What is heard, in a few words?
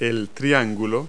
triangle; Multi-Lingual Talking Picture Dictionary